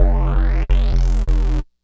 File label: bass, Bbmin; Big Fish Audio; Hardcore Breakbeat Action